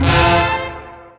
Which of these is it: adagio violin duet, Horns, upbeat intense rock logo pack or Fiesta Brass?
Fiesta Brass